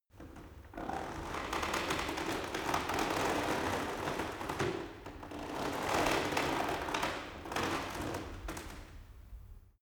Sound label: cart medieval creak; kutsche; verkehr transport